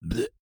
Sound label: Monster Spit